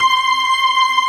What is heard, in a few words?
P-ORG2 C6, ORGAN; AKAI S-Series CD-ROM Sound Library VOL-8